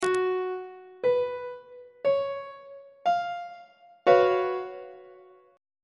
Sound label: g flat major 7th suspended 4th chord on treble clef